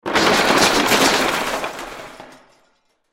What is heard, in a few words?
Crash Junk Metal Debris; Debris (Sound Effects:Materials); Blastwave